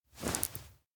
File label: toss konpeito mouth; awayuki; anemoi